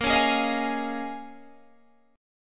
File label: Bb Minor 11th Bb down Guitar Open G